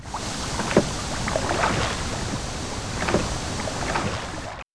sailboat

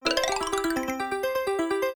game win

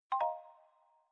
click multimedia

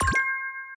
right answer effect

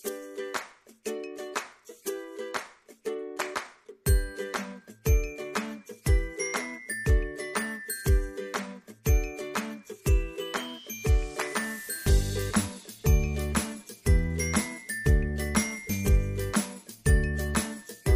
music cheerful; bgm

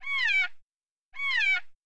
rhinoceros calf

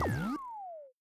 wrong answer

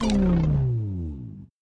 wrong answer car engine down

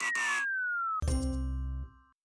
wrong answer

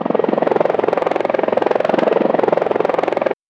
helicopter

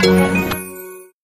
click multimedia

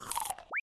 shark bite

